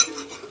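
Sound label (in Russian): металлический лязг кастрюль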